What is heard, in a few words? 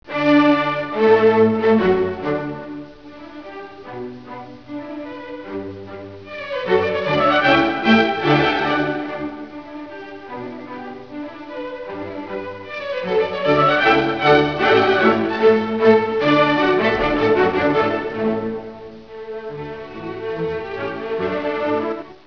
mozart violin